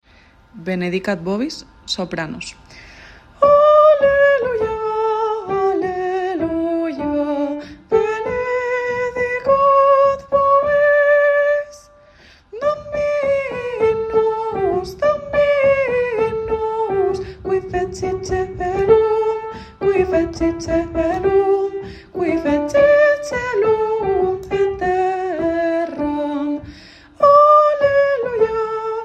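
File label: benedicat soprano; Benedicat vobis; Georg Friedrich Haendel; Navidad JOCSMAB 2023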